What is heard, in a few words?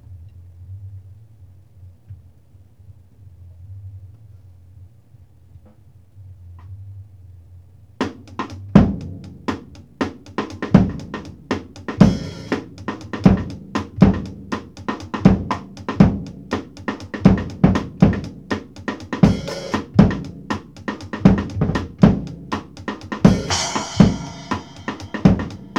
beat complex funk rim 120, acoustic